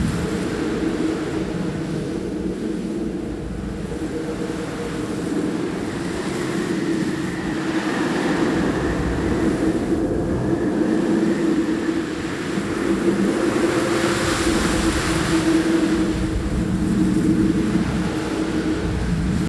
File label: Settlement Interior Tunnel Ambience; Warframe; Grineer Settlement